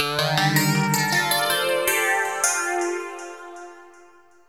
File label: ELECGUIT, AMBNT, 160, C; Ambient; Guitar